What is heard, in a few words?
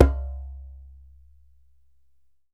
DJEMBE, African Drum